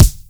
rich highs bass drum g key

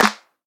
clap single shot g sharp key; single hits one shots

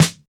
dry snare sound e key

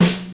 fluffy acoustic snare sound f sharp key

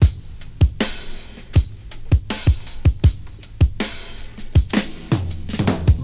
79 bpm breakbeat e key

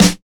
sizzly snare F sharp key

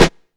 low steel snare drum sample C sharp key

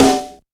subtle reverb snare drum sound F sharp key

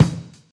kick one shot E key; kick drum samples

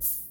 clear rap open hat one shot a key

